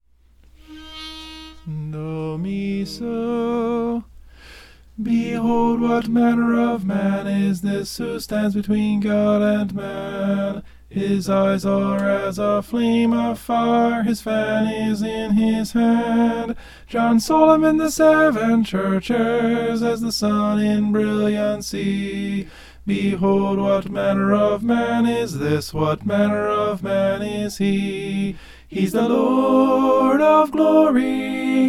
tenor; behold what manner

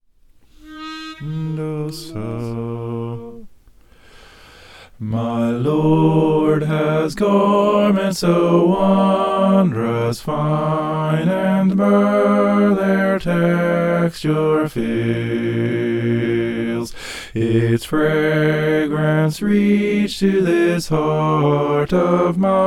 bass

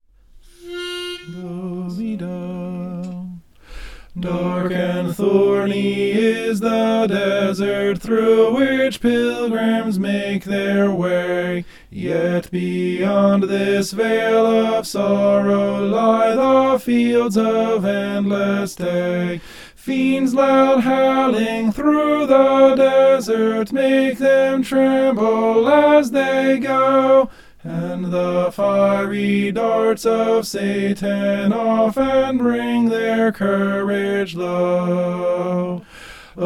soprano

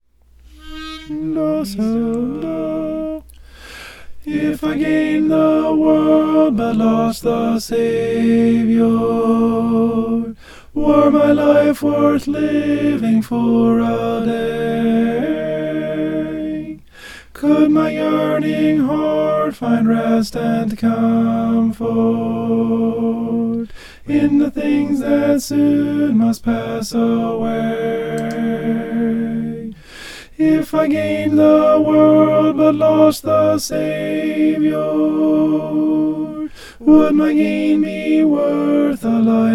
alto

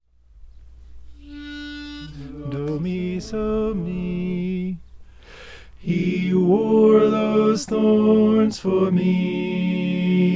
tenor

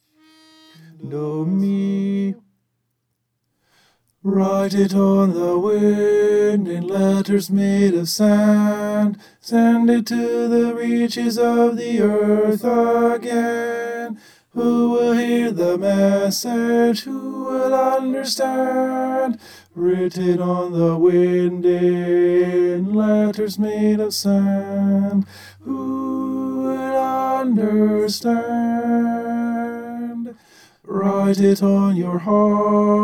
tenor

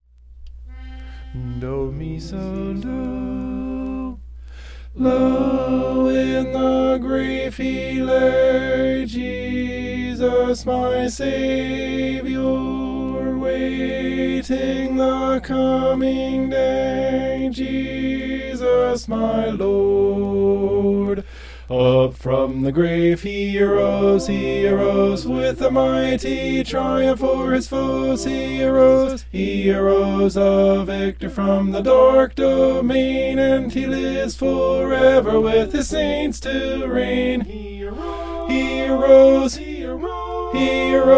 tenor

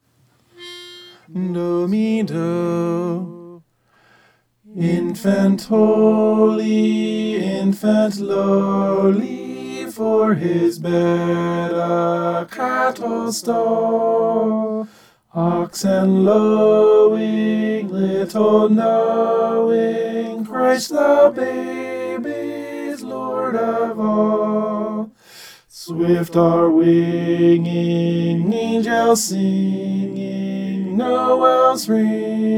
tenor

